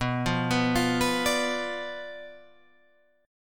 B Diminished B down Guitar Open G

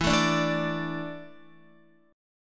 E 7th Suspended 4th, Guitar; E7sus4 strummed; JGuitar

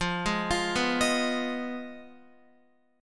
F Minor 7th Flat 5th, Guitar; arpeggiated; JGuitar

